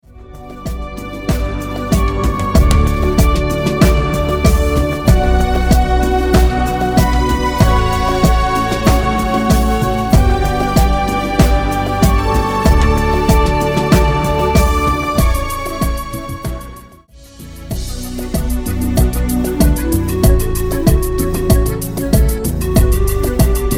Cumbia Canto

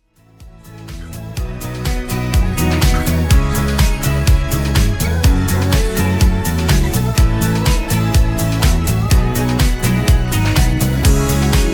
Ballo Di Gruppo Groove Continuo Con Rap

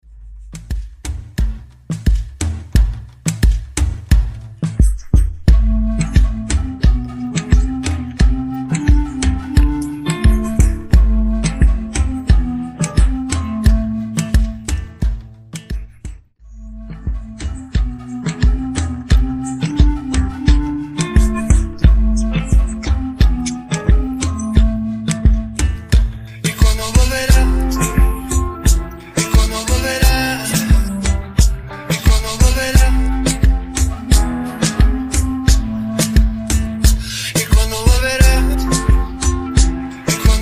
Remix Canto

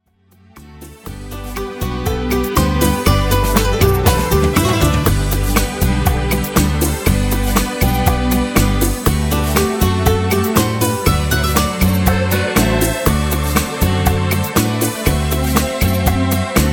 Chitarra Vagabonda Bachata Beguine

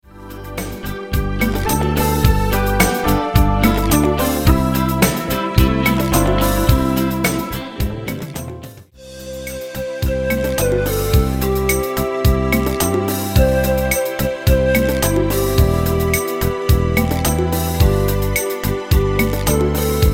Moderato Beguine Canto

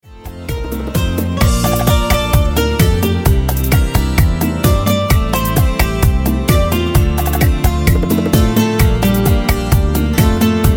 Ballo Di Gruppo Canto

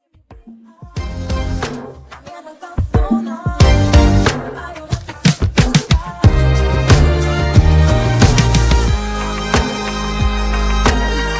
Groove Continuo; Sanremo 2023

